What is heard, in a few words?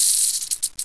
rattle, headcrab poison; hl2